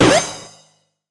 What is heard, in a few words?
Boom Boom Stomp